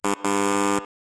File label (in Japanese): リバーブクリップ適用